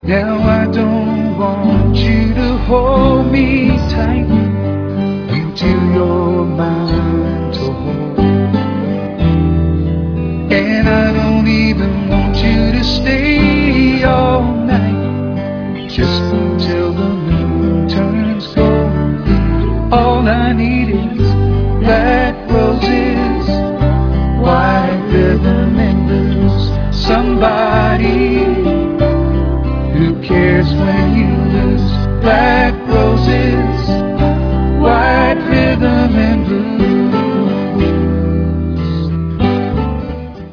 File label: wrythm blues